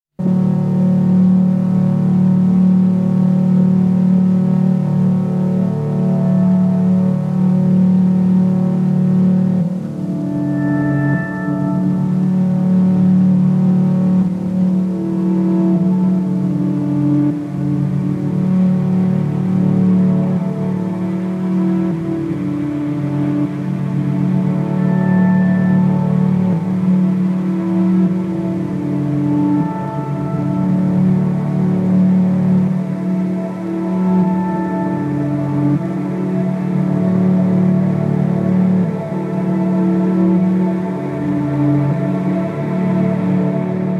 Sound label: Old Pad